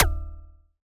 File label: Alien FM Perc Short